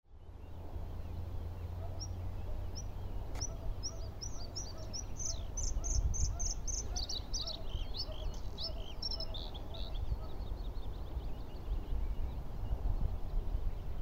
podróżniczek

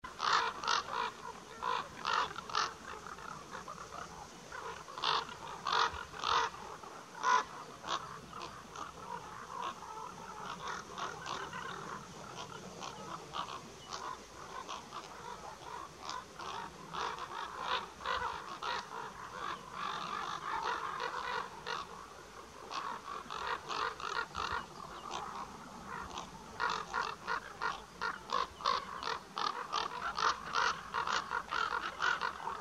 kruk

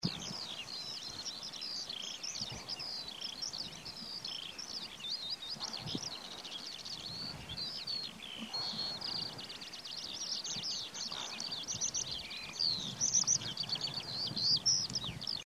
skowronek

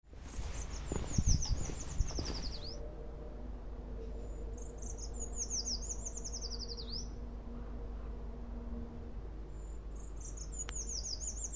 pelzacz lesny, ptaki; głos; Pomurnik

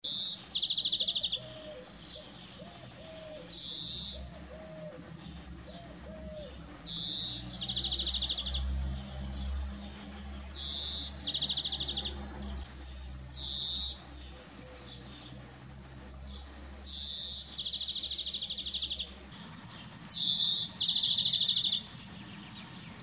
dzwoniec, ptaki

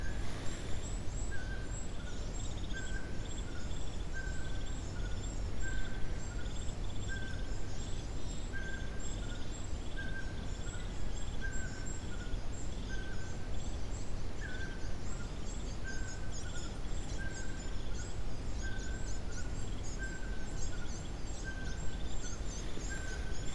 soweczka; głos

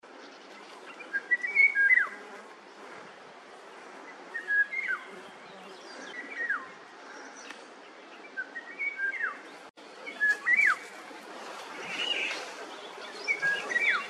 wilga, ptaki

